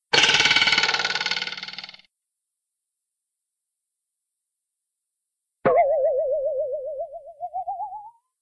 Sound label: twangs; cartoon; hannah barbera cartoon sound fx